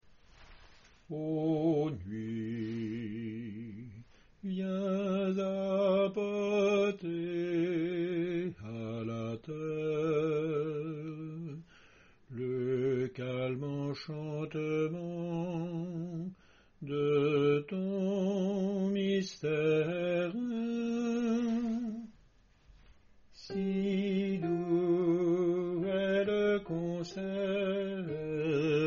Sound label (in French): basse